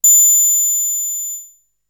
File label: Perc; ZERO G - Datafile DISC 3